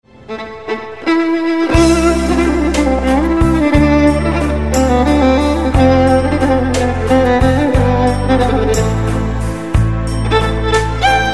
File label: Ringtone; sari galin